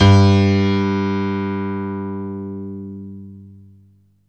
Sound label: PIANO G1; Best Service Alpha Dance vol.2